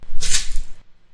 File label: rainstick shaker; ETHNIC and WORLD PERCUSSION